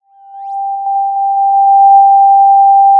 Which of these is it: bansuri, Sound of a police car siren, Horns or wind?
Sound of a police car siren